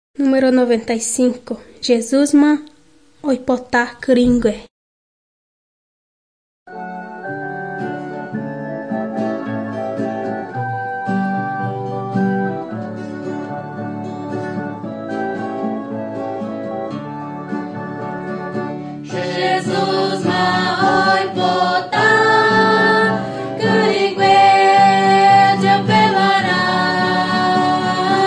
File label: songs guarani mbya